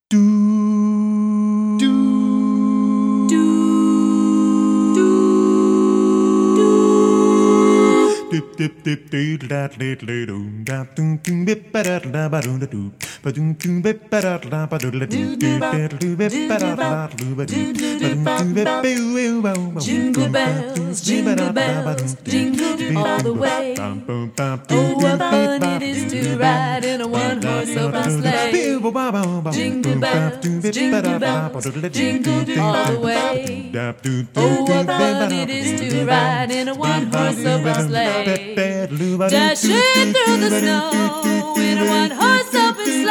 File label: jingle bells